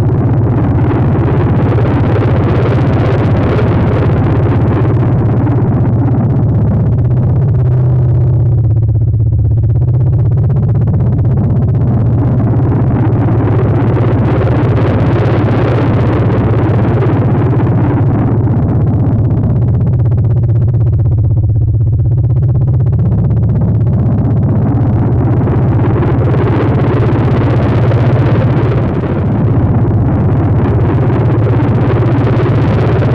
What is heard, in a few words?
giant fan sci-fi pulse; Titanfall 2